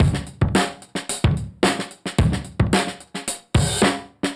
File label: Beat Xtra Dust 110, dusty funk; musicradar; Beats; Alt Sound